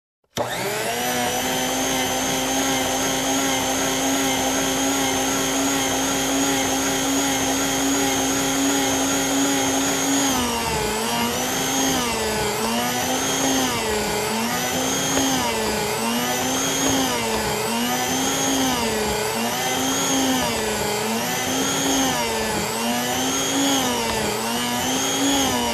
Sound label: Hand Mixer